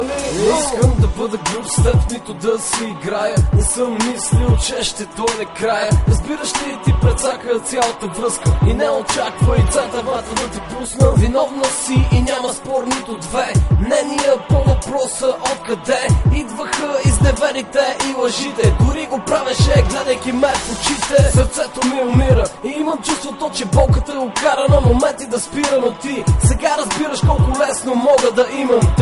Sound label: hip hop